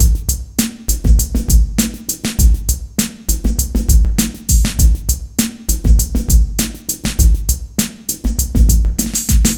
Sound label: cheap beat 100, Dance loops